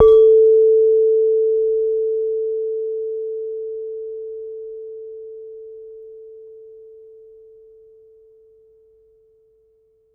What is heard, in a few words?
Saron D, Gamelan